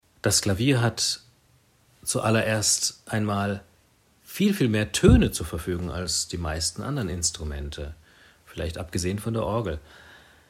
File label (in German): klavier